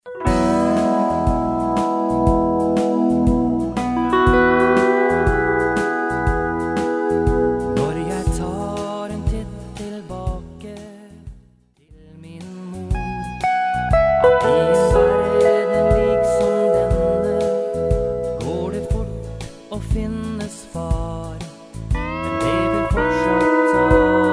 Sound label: steel